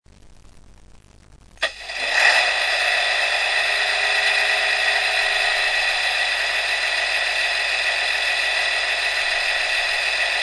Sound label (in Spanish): motor, Locomotoras; SONIDOS ADECUADOS PARA LOCOMOTORAS; TER